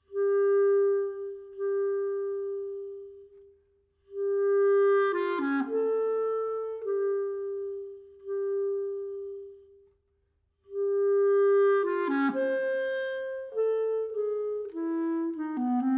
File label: Duo for Oboe and Clarinet Improvisation